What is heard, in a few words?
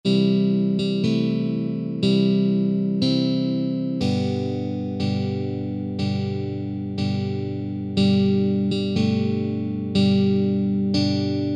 Happy Birthday Guitar Slow Tempo